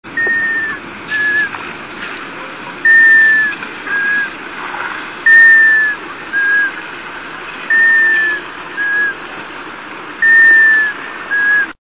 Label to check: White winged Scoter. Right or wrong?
Right